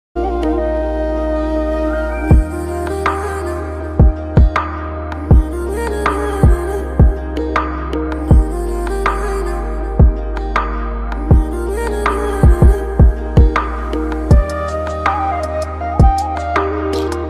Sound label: music ringtone